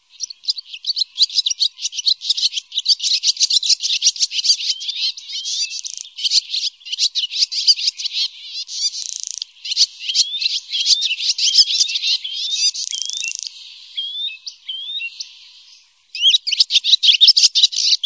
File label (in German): Stimme, Vogelstimmen